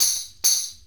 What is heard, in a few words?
Tambourine